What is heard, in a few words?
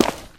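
cleats concrete, footsteps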